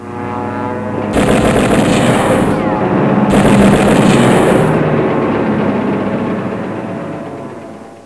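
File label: air combat; PARADISE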